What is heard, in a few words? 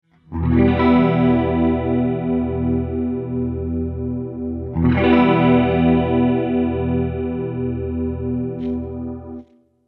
detune